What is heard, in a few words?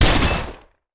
Shotgun; Sound Ideas; Guns